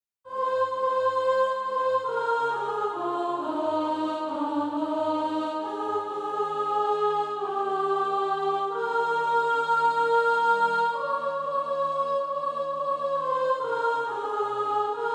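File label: soprano, SATB Practise Music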